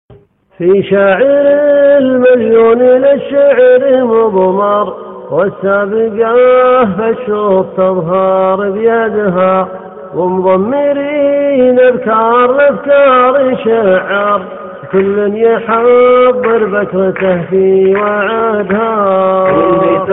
Arab rhyme

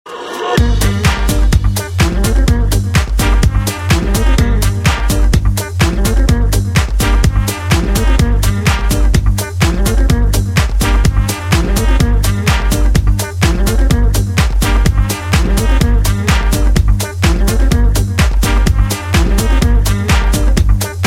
Deep Sounds

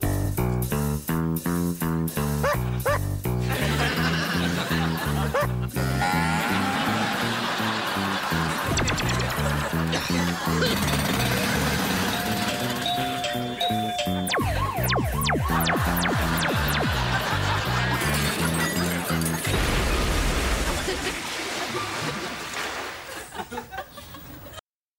keyboard; ross geller

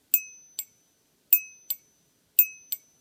chime; AE86

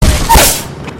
downed enemy; Apex

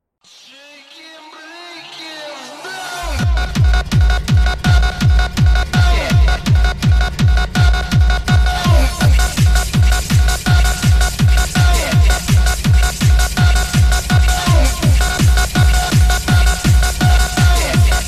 Hardbass

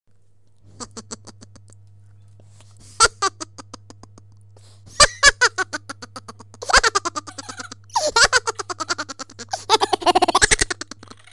Duck Laugh

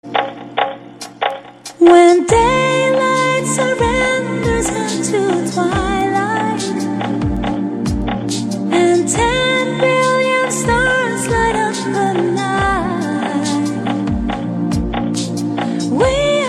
Chill Out Remix

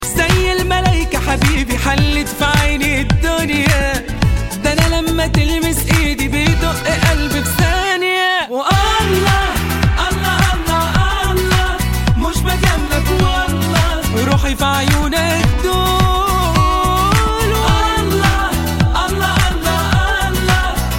Arabic Love

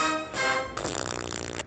Dun dun dab

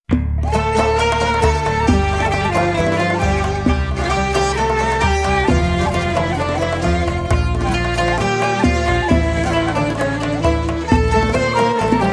Uyghurche

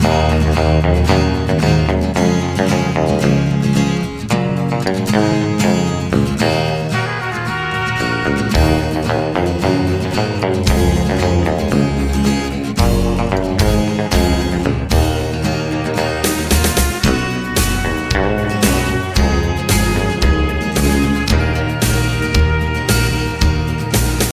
Cowboy music

